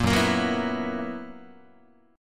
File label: Gb 11th, Guitar